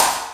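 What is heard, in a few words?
BLOCK KNOCK; Pro-Rec - Synthsonic; TRINITY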